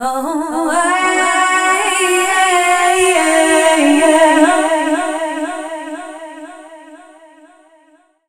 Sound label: Dance Vocals, FEMALE